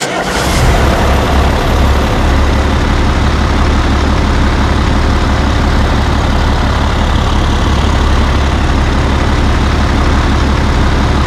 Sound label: Bradley engine idle; DCS